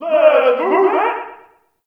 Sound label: ambient campsite yells; Warframe; Tau